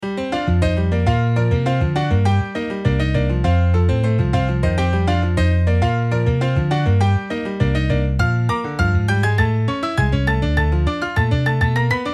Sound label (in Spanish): Salsa Timba